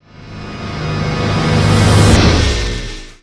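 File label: stinger guitar in; transformers war for cybertron gamerip; IACON INVASION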